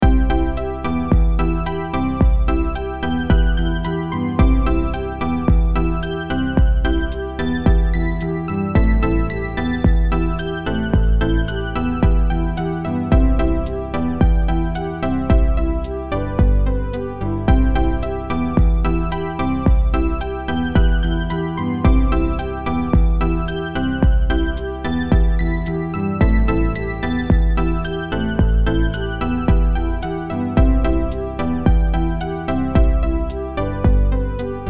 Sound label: Sequencer Song